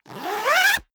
zipper down jacket close fast

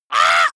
monster painful cry high

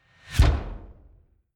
incoming kick drum

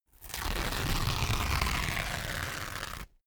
paper towel roll sheet tear up

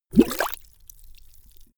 bubble short

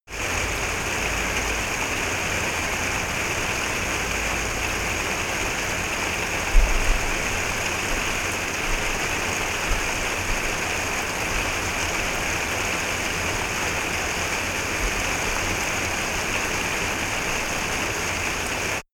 summer rain

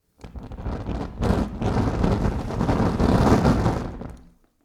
fire torch flare heavy

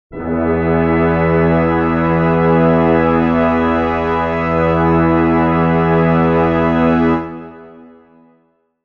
brass chord e major

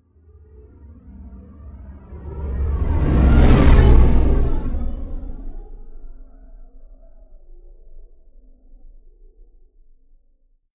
whoosh race

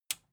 metal button tiny push disable

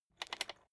rotary control short quick turn soft

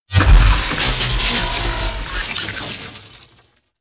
disintegrate

